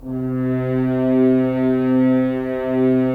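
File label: Solo French Horn, Brass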